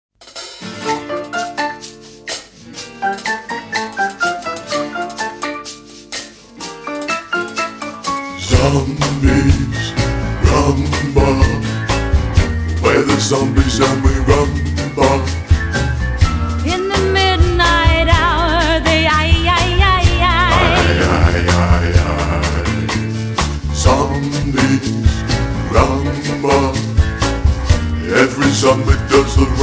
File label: ZOMBIE RHUMBA